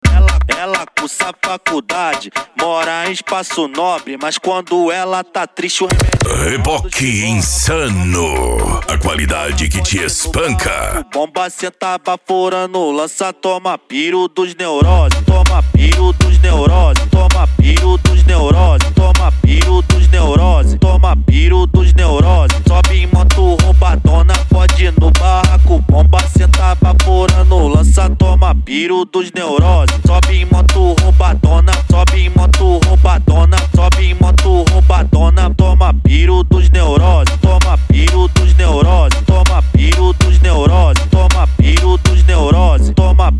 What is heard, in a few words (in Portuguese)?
noia dance e funk mega bass